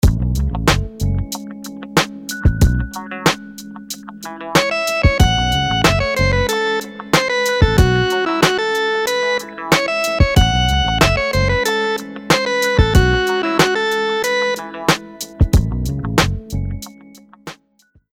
rhythms dotted 8s

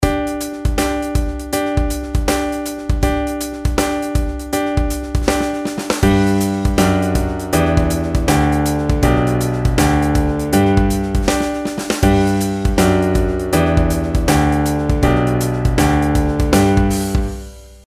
pitch patterns skipwise

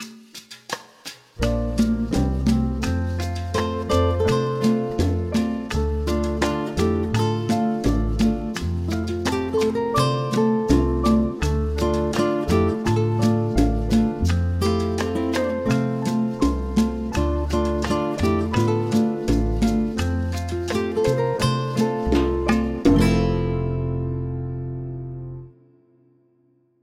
major key chord singles; harmony

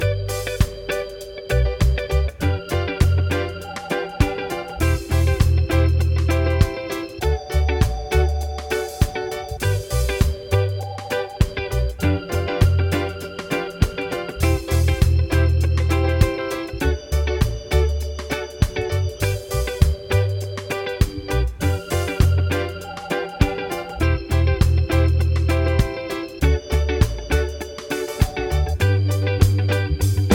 progs with applied chords

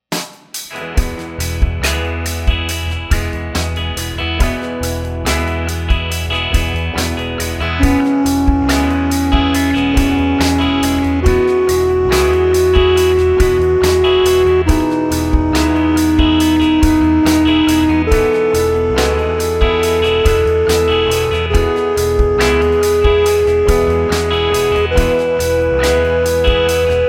scale degree

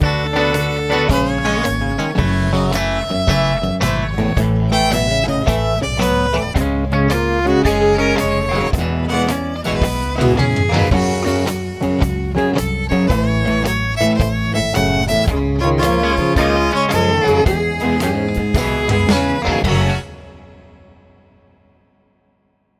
minor key chord triples, F sharp

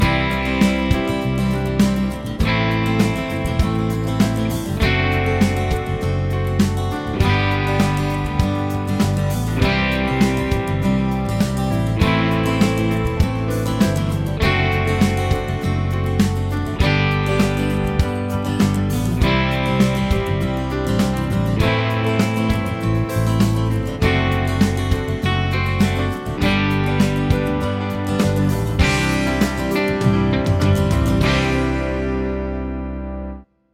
progs with applied chords

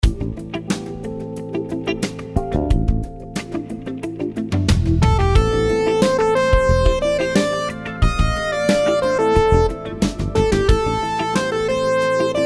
rhythms 16s syncopated

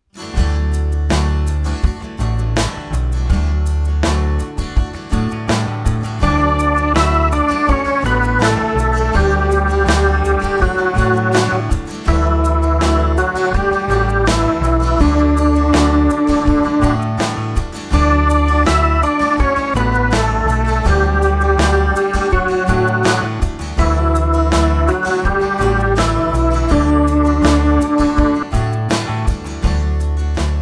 melodic dictation

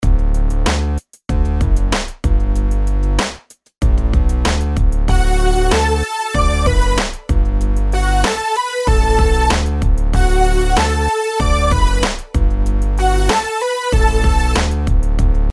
rhythms simple 8s major

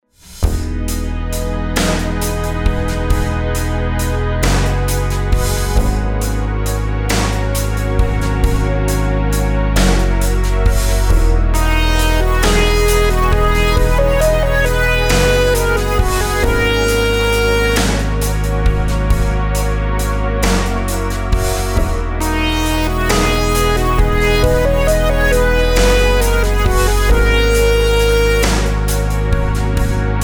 rhythms compound 8s w16s

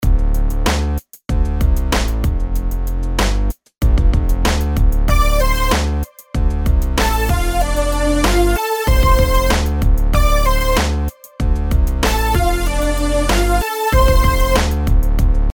rhythms eighths

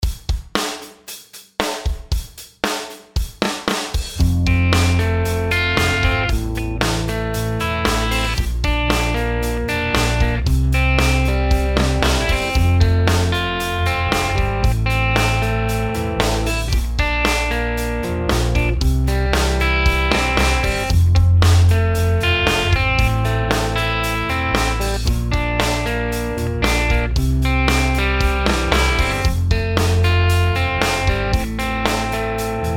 chord progs maj root

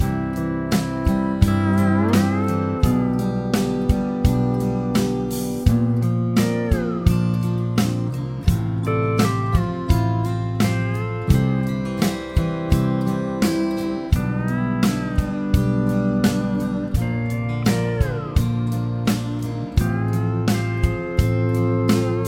chord prog with mixture

